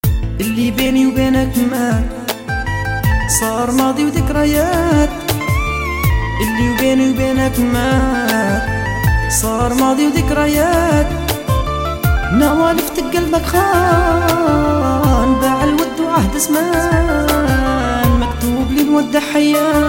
moroccan music

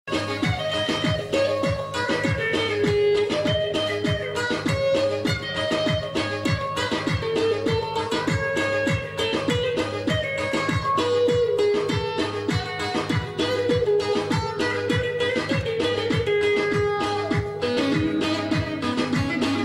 Great Saz

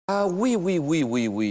Is Calling Pop

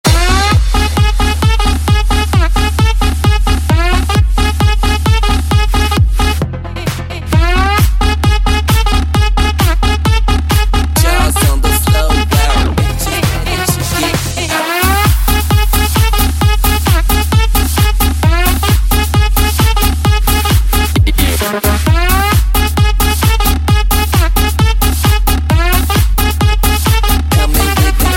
Clubsound Club

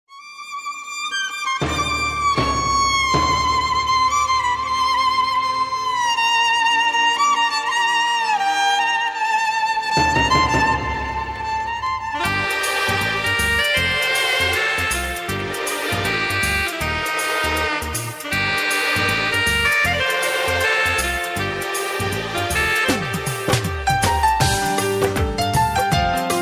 lovely violen

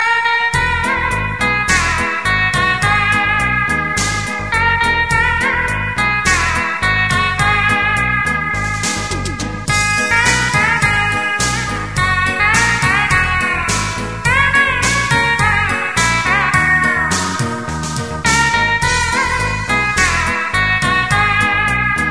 love guitar

